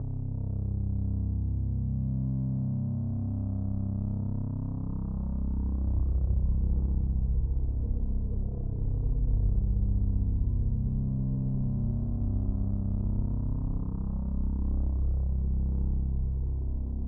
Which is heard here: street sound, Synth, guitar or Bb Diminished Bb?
Synth